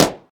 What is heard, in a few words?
original steel snare drum sound d sharp key